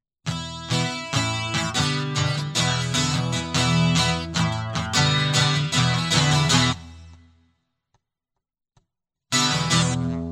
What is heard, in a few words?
Guitars Solo; hyper terrestrial; Kinesthesis; Guerillas With Guitars